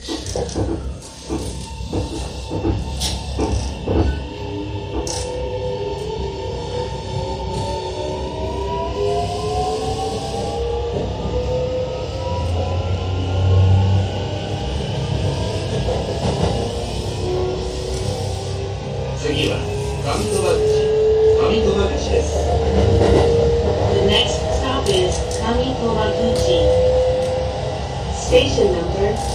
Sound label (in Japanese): 近鉄更新車（SiC-VVVF） 竹田→上鳥羽口